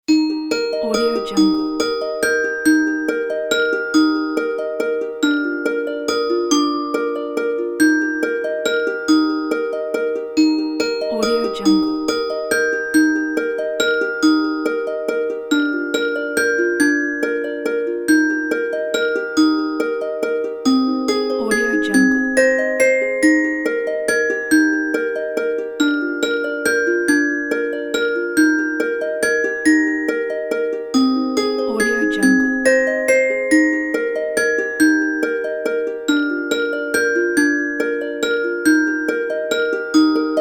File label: lullaby